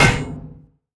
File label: Hit Metal Generic